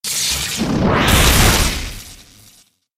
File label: thunderbolt